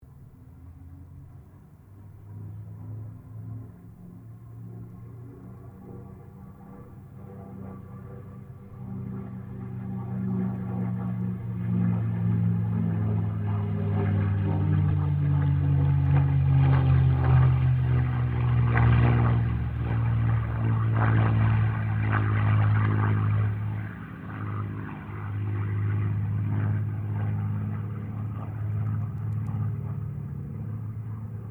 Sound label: AIRCRAFT CAC CA-18 MUSTANG MK23 PASS DISTANT; CA-18 /P-51 Mustang; Airplanes; Vehicles; Aircraft Collection; Twistedtracks